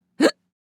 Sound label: Woman hiccup short female